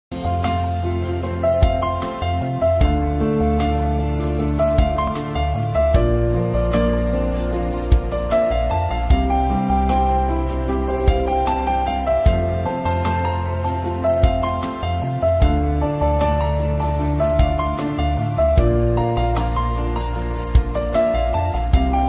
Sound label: instrumental ringtone